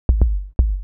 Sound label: Kick Low; hydrogen